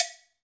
COWB, Cow Bells, Roland

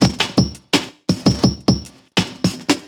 Break, Drum N Bass Percussion

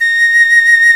piccolo

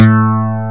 DIGITAL BASS, BassGuitar

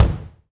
BD, BD REAL

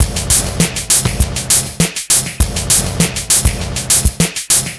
Noise Beat; Drums & Drones

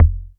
MINIM BD; DANCE KIT

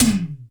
TEK T, TECHNO, Drum Base; 90 sSampleCDs; Crypton - DrumBase5000

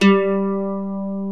G2 MANDOLIN

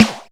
Flange Snares

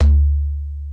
PERC, ETHNIC DRUMS; Crypton - DrumBase5000

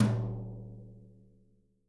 BRUSHES, Living Drums

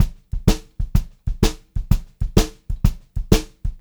VARI, Pop-Rock Drumloops; USB Soundscan vol.37; AKAI; 90 s Sample CDs

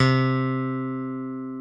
C2 LONG, BASS; D-STIK BASS2

